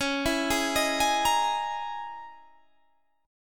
C sharp Minor 6th Add 9th C sharp, Guitar Standard; C#m6add9; arpeggiated; JGuitar